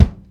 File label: Bass drum